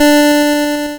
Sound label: NOTE D4, LSDJ; LIMAT LSDJ kit; LSDJ Notes; NOTE KIT 6; Drums